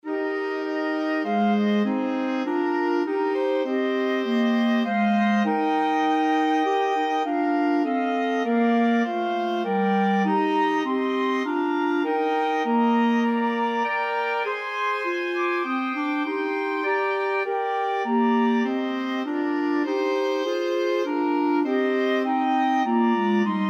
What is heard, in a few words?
flexible mixed ensemble, 4 players